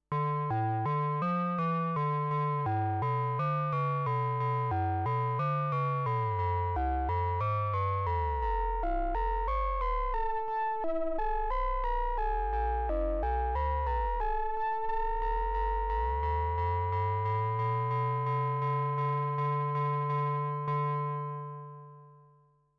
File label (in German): MOOG MATRIARCH Ringmodulation Klangfarben